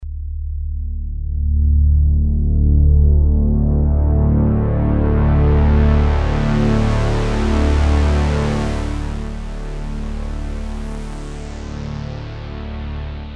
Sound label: Lowpassfilter Prophet5